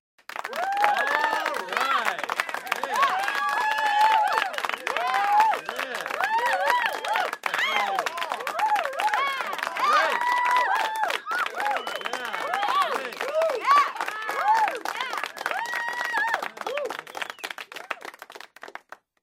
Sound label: old vocals